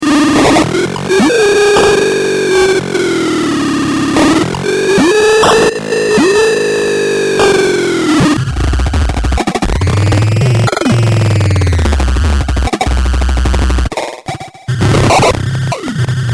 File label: fine pitch